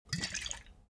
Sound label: object bottle vodka upend; smartsoundfx